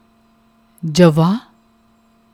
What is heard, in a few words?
Javah